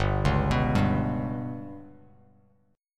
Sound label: G Major Flat 5th Bass Standard, chord; GMb5 arpeggiated; JGuitar